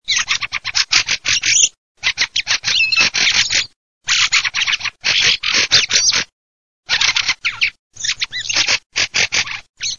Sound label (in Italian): cesene